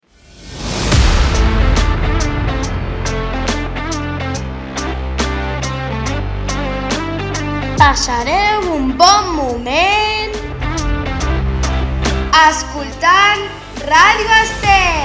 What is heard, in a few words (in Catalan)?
Logo sonor Radio; Radio Estel